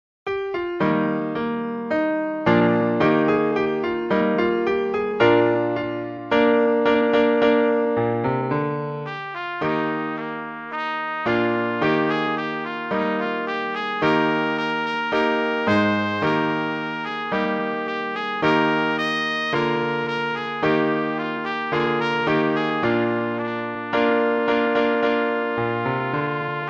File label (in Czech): trubka; Přednesová skladba pro trubku; Rondo; J. J. Mouret